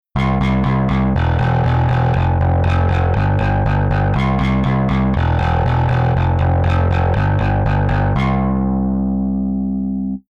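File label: bass effector overdrive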